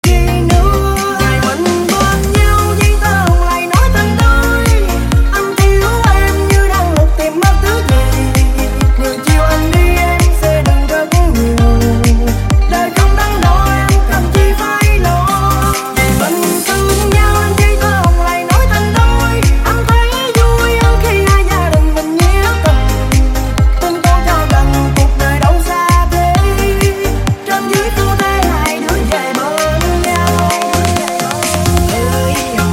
EDM Remix

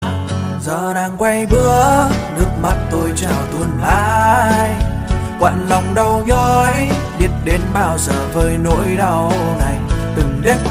Vietnamese Cover

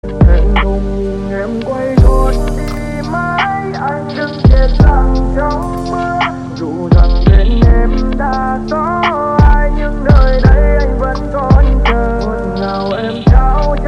Lofi Version; Nhạc Chuông

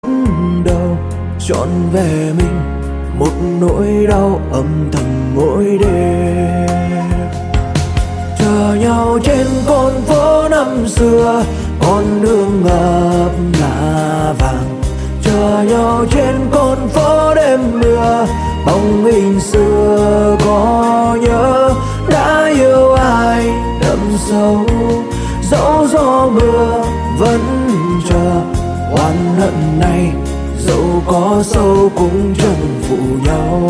Vietnamese Cover

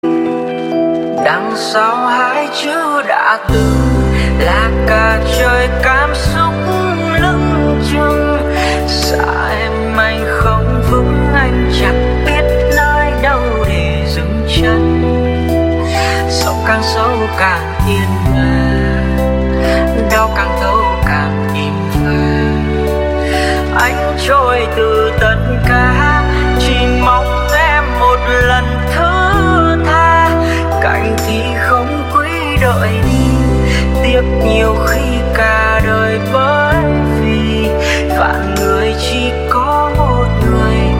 Lofi

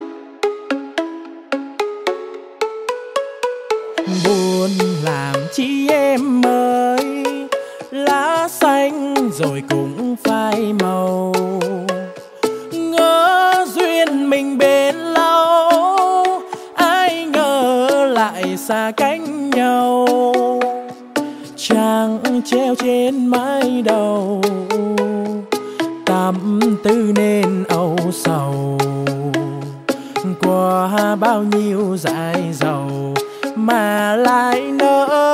Rap Version

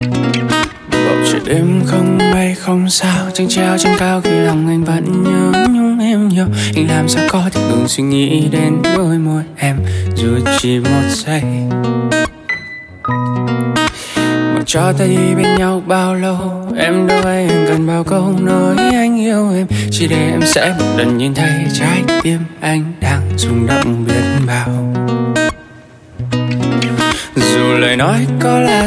Acoustic Version

